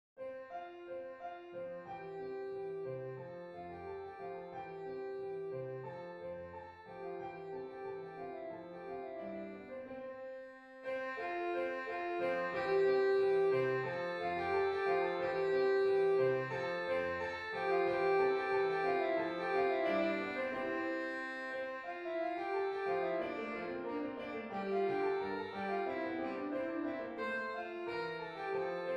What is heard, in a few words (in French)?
gavotte majeur; corelli arcangelo